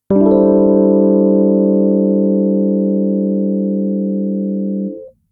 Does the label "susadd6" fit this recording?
Yes